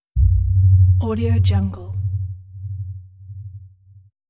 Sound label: low pulsing gong impact; hollywoodedge